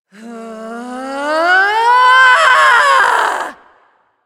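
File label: battlecry, female; smite; voicepack; hera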